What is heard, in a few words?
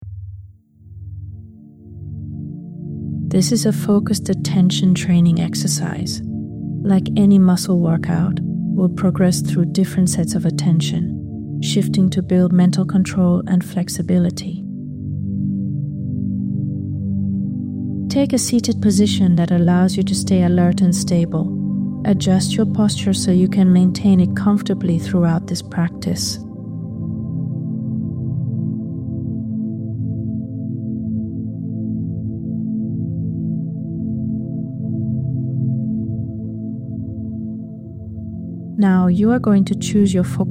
Inner Outer Focus Music